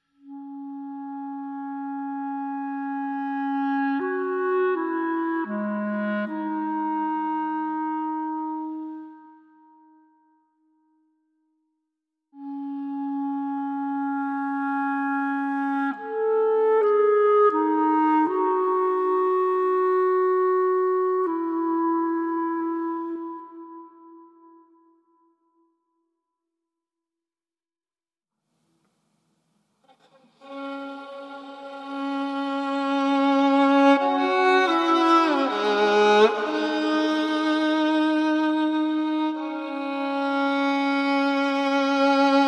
Only Melody

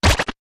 Harsh Squidger

